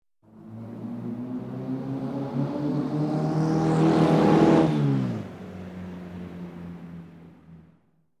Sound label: Racing Car Passing by